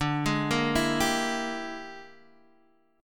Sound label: E 7th Sharp 9th Flat 5th D, chord